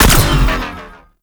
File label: Space Fighter Elite Laser Fire; Warframe; RailJack; Corpus; Space Fighter Laser Agent